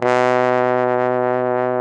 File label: BARITON; Best Service; Alpine Volksmusik